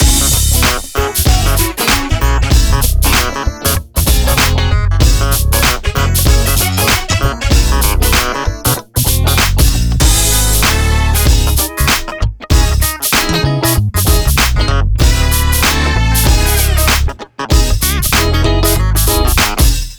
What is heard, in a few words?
mix 96bpm